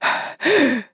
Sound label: death fem; player